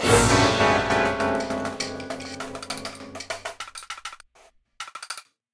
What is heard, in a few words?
Fight High Fail Combo; Remember Me